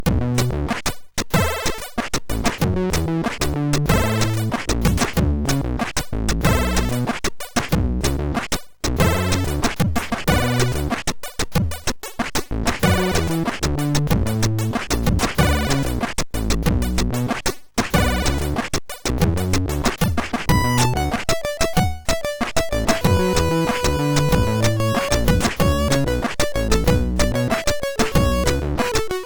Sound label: Hurtig, MOS6581R4